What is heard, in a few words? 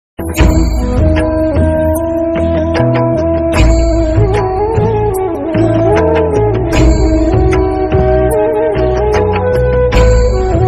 bhakti song ringtone instrumental ringtone; sawariya seth; shyam baba